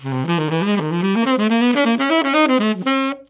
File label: CRAZY UP, TENOR SAX